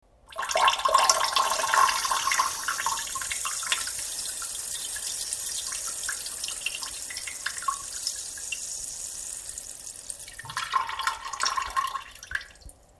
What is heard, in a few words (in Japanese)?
ぽちゃしょーちゃちゃおしっこ